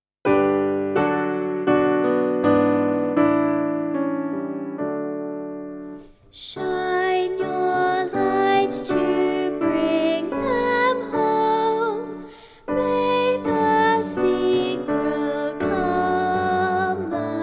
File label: The Lantern Song vocal